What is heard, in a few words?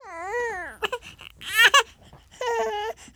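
TALK, baby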